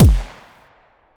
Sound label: head pistol shot; weapons; Half Life Alyx